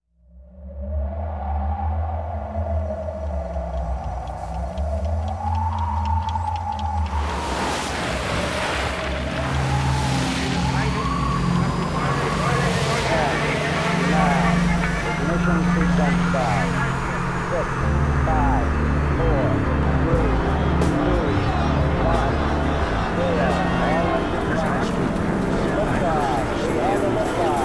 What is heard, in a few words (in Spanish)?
PUERTA FUTURO